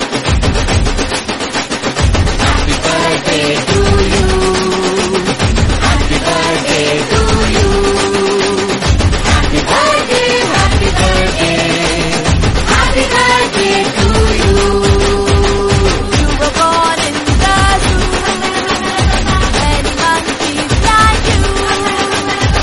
Happy Birthday Mass Song

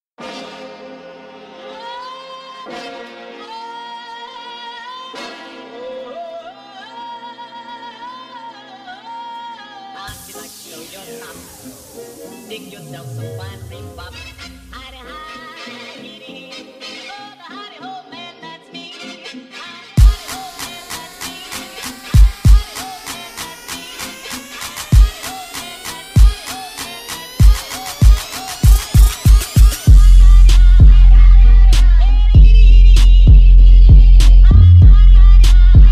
Bass Boosted

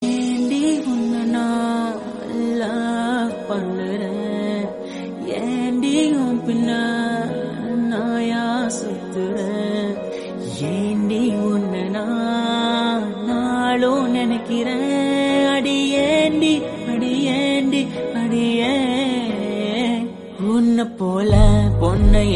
Tamil Heart Touching Love Album Song

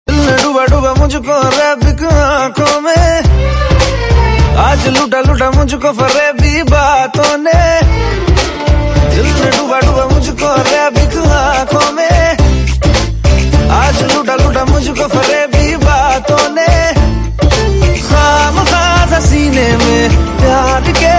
ringtone; airlift 2016

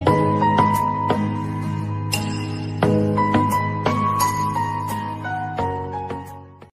Cute SMS Ringtone

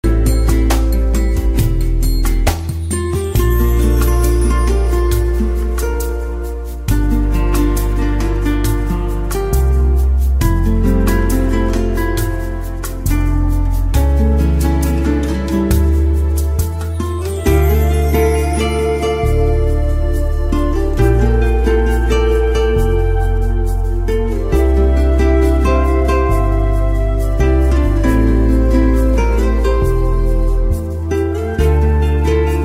sweet spanish guitar

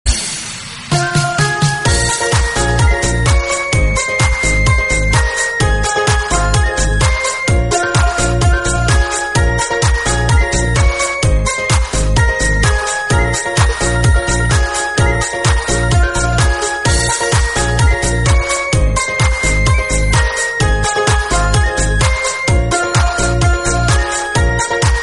ringtone dj

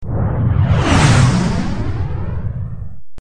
jet dive

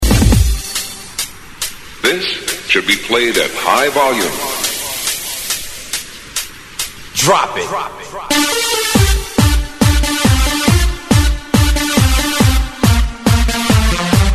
disco

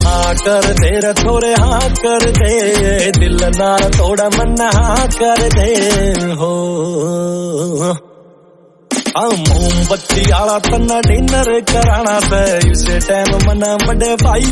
Haryanvi

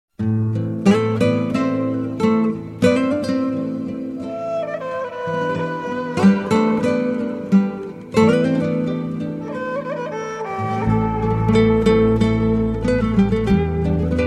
Guitar ringtone

